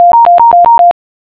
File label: Jingle